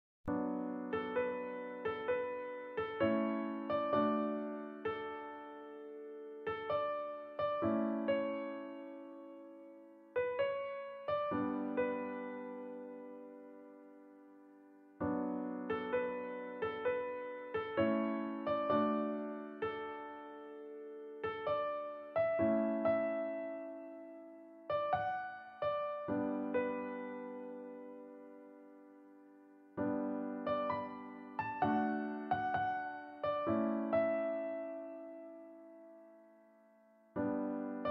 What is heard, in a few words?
EASY PIANO; midi; Synthly